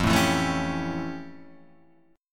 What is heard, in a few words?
F Augmented Major 9th, Guitar, Half Step Down